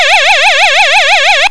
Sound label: hypno ray